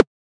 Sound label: POP; ModelCycles